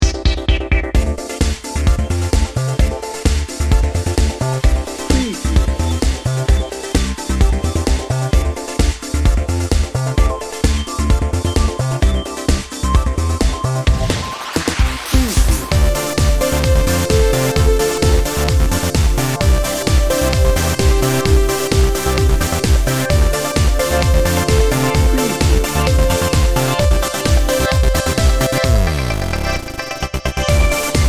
energy pulse

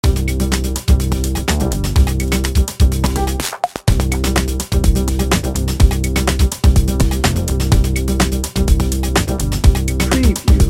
energy rush dnb

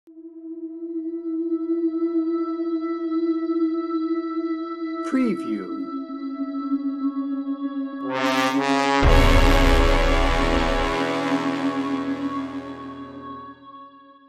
heroic drama action no guitars

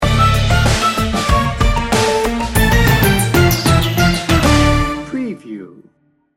seduction sting bumper

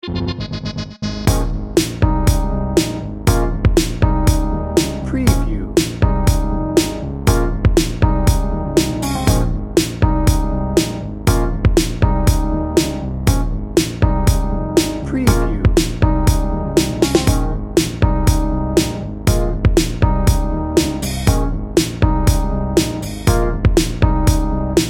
80s pop r&b bed

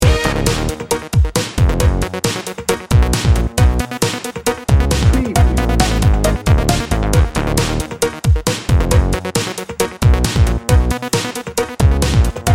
energy bounce

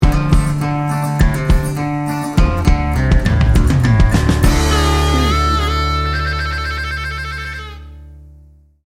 swamp boogie sting bumper

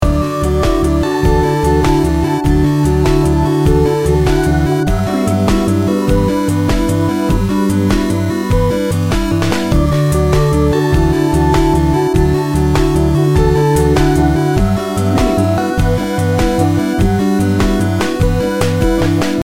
sweet and mellow loop

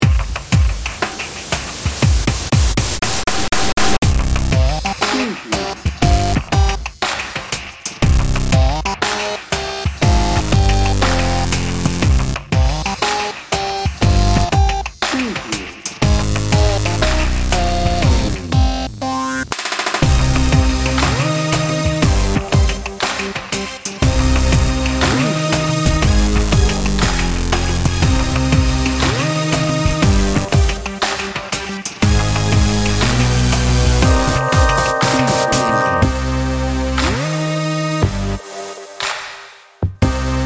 dubstep downtempo

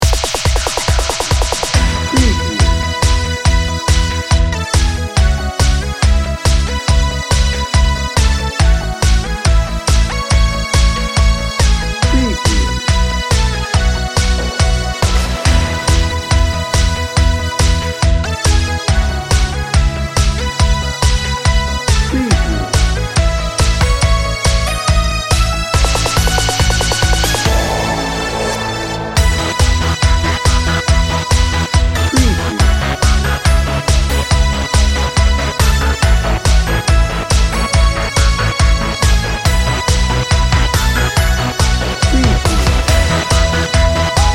positive direction

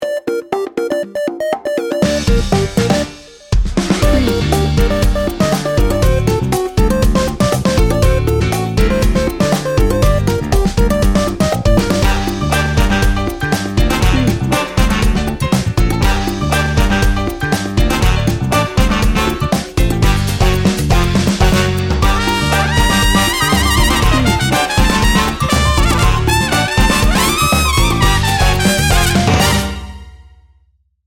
sassy salsa

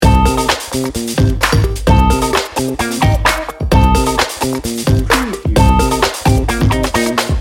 good time funk loop

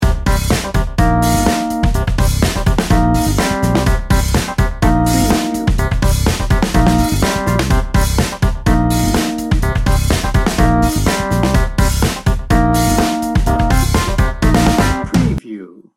festival of funk loop

